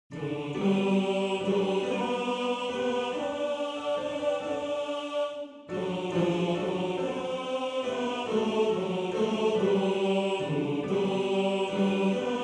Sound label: Tenor 2, maennerchor; Schifferlied